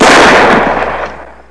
revolver; gunslinger

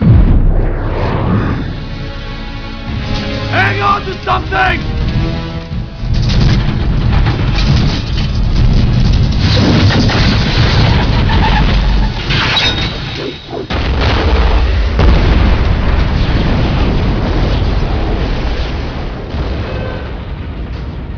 earthquake

